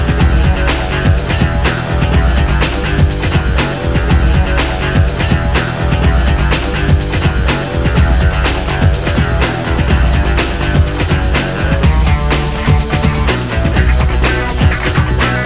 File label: theme